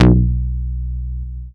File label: Moog Bass